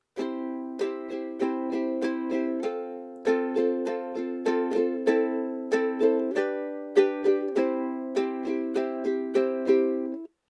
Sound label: new soul slow, ukulele